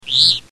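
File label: House Finch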